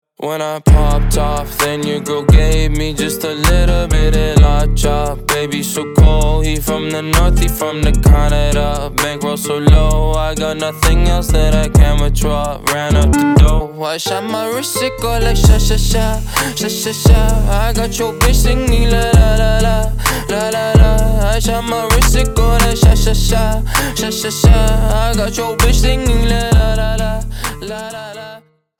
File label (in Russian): lalala